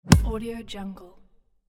football kick

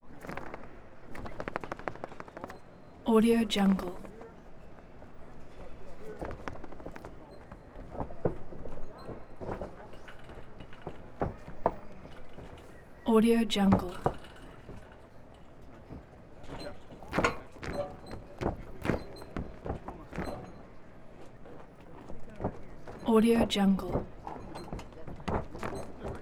wrestling rope impacts

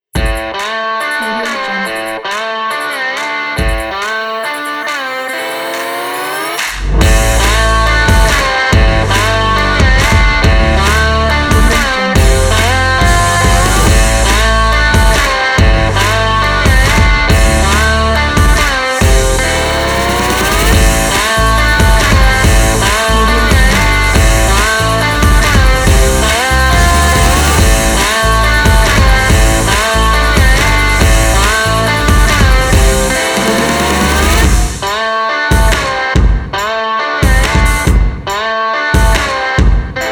drive energy sport rock

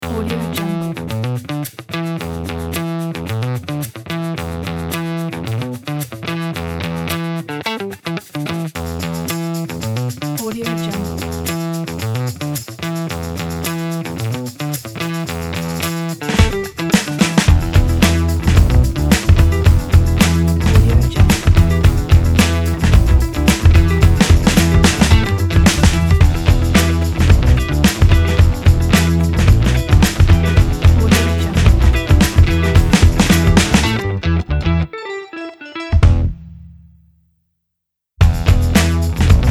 energetic vintage funk beat groove kit

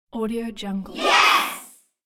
children shout "yes"

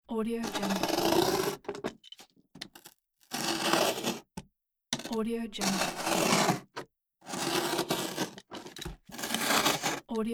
cutting cardboard; soonus

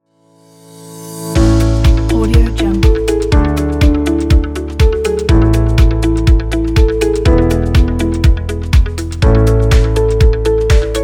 inspiring upbeat corporate

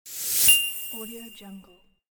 elegant logo ident

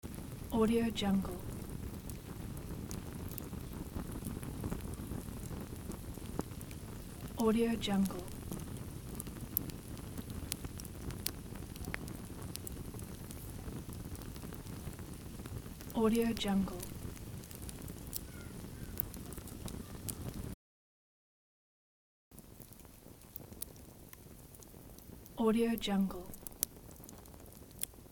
campfire loops; warpjumper

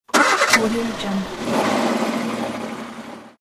car starting

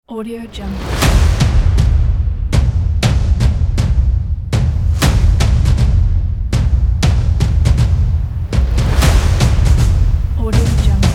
drums

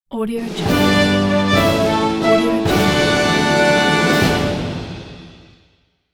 win

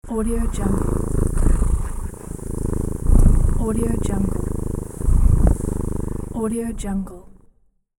purr

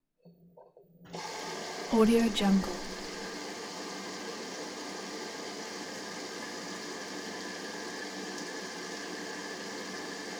washer draws water

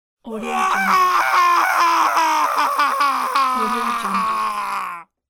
male screaming

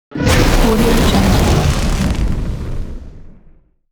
dragon roar

